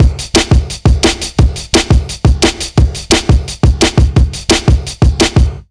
173 BREAK, Jungle; USB Soundscan vol.05; Explosive Jungle; AKAI; 90s sample CD

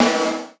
GATE SD, Drums Acoustic; USB Soundscan vol.10; AKAI; 90s sample CD; GATEKIT 1

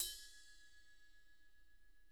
ride

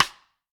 Snare Drum; Q Up Arts; Johnny.C's Vintage Snare Drum

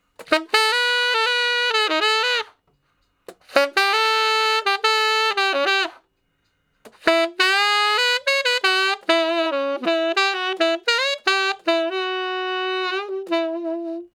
Sax Slo Blus (E V, IV, I, I), Ten Sax; Solos; Crimson Blue and Fabulous Horncraft 4 RnB; Horncraft for R&B

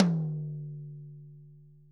ROTOTOMS; Sonic Reality

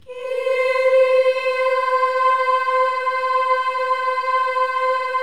KYRIE, Symphony of Voices; Spectrasonics

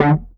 EFX SHRT D3, bass; Sampleheads; Ultra Freakin' Bass; EFXBASSSHORT

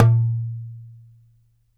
DARABKA, Mediteranean Traditions percs

